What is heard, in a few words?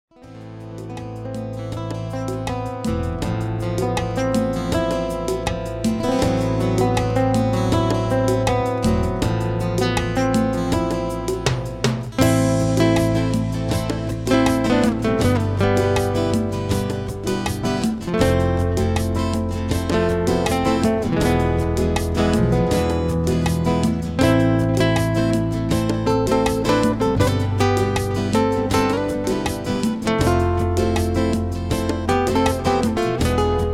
Gypsy Guitar